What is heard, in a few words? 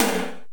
Korg x5, Drums